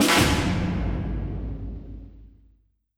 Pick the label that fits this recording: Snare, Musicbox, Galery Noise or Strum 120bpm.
Snare